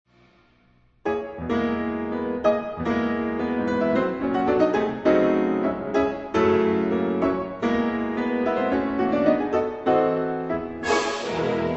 piano Toccata; Concertante

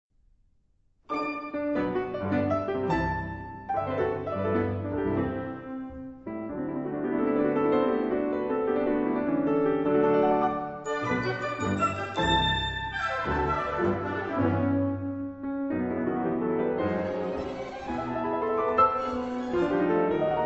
piano Danse; Concertante

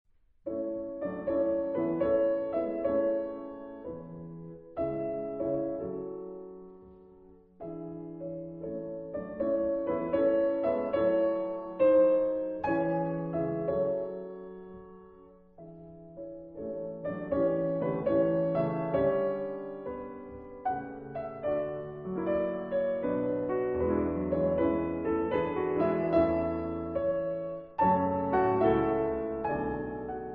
piano, 2 pianos; SONY Classics

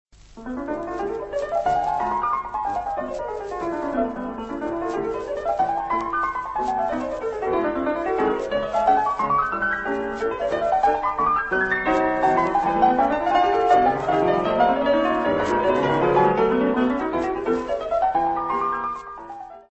etude, piano; Deux études : n°1, n°2; Club français du disque

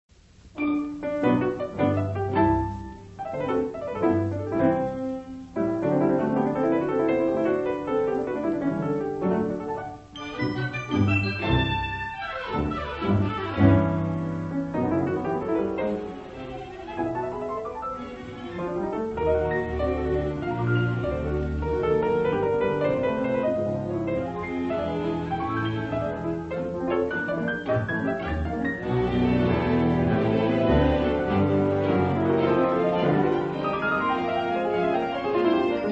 piano danse; Concertante

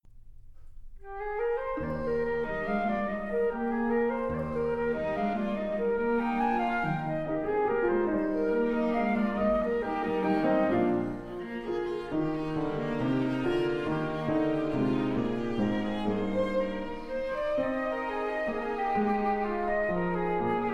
Divertissement TIMPANI

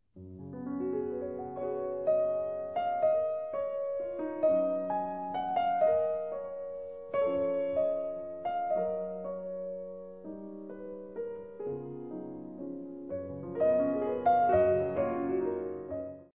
piano, 2 pianos

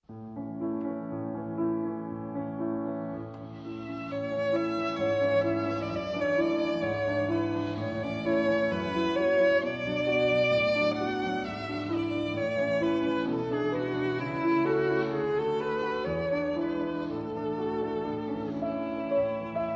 Romance violon piano; Romance, violon et piano (Paris : Heugel, 1906).

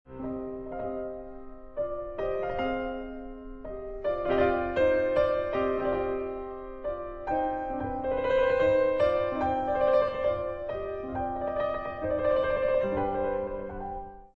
piano, sonatine

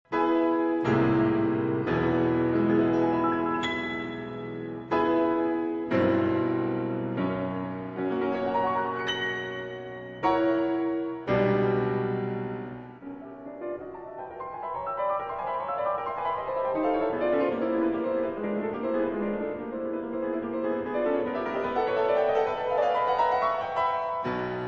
piano Toccata; Concertante

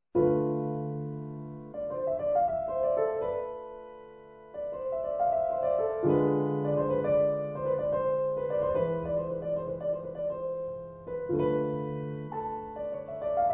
piano

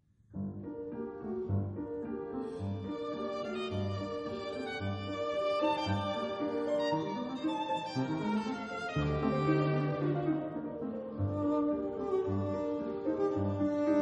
Quatuor piano; Paris : Heugel, 1946; 3. Andante

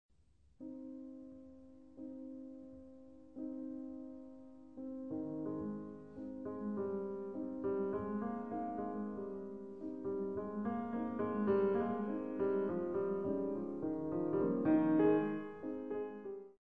berceuse, piano 4 mains

